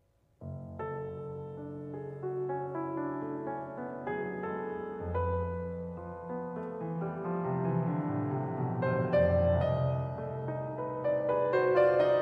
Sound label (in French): sonate pour piano; 1er mvt